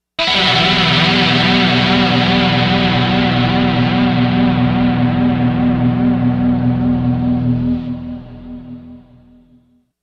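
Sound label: dirty guitar e1005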